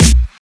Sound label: dry snare drum b key